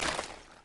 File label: ice block smash; frozen rush